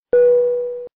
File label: Ding; meloboom